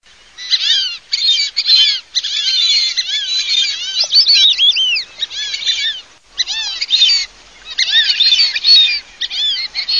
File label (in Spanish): SIRIRI MIX